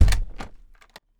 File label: DOOR Entrance Wood Close